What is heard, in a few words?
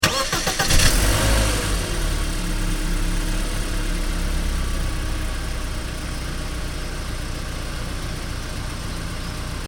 Car: Engine Start, Exterior; Cars; Vehicles; Stuart Duffield